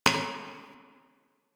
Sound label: Idle Heavy Hoof Stomp; Ambience; Warframe; Entrati; Tagfer